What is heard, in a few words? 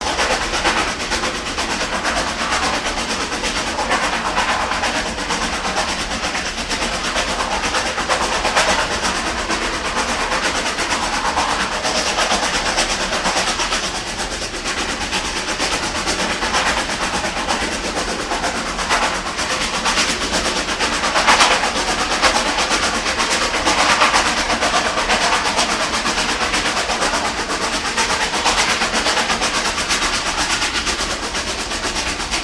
Steam Loco Daylight, steam train